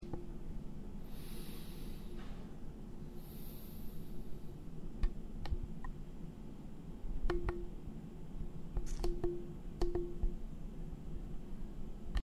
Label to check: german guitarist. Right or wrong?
Wrong